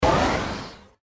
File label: Burst